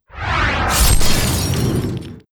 Pain dealer; Fly For Insanity

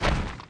spirit death; FlyForInsanity

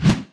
attack; Fly For Insanity

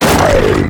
Vehicle Dmg; Nuctu; Fly For Insanity

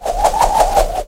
Skill strong wind; FlyForInsanity

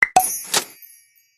purchase success; Candy Crush Friends

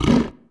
attack; Fly For Insanity

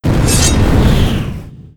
Cross stk; Fly For Insanity

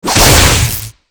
STAFF THUNDERBOLTS; skill; FlyForInsanity

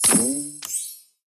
jellycake break; Candy Crush Friends

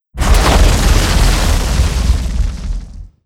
Avalanch; Fly For Insanity

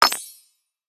piggybank gold bar collected; Candy Crush Friends

